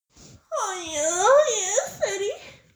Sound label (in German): stohnender junge